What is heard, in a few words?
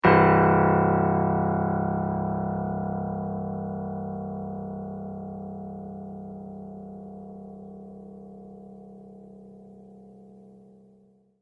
loud b0, piano